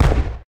giant jelly explosion; Risk of Rain

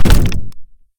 pod death; Risk of Rain

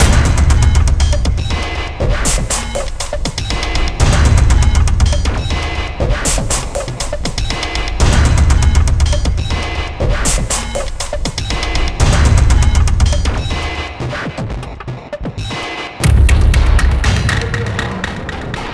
Test Song Allert; synthetik

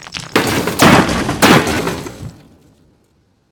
Guard Spawn; Risk of Rain

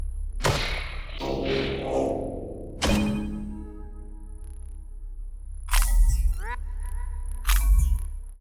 module collect noise; no cutscene; HLD

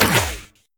huntress shoot; Risk of Rain

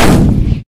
miner shoot; Risk of Rain

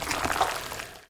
spitter spawn; Risk of Rain

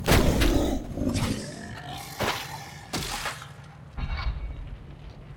emperor death; HLD

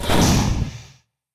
Lizard Spawn; Risk of Rain

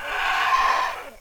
Child Shoot; Risk of Rain

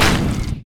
imp shoot; Risk of Rain

